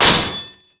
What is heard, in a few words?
Warrior Blade Swing; Playable Tutorial Level; TR2